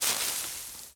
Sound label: leaves step; Golden Crayon Sounds